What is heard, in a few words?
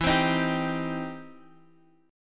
E Minor Major 7th Sharp 5th E down Guitar Half Step Down